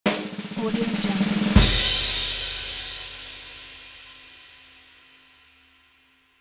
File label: drum roll